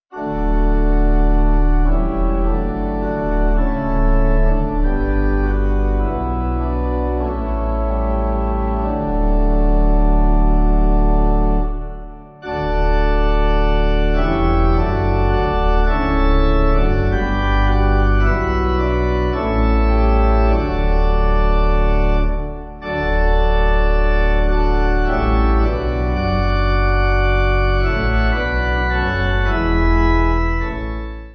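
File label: Pipe, Pipe Organ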